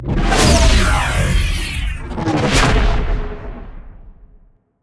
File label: combo energy layer; smite; god arthur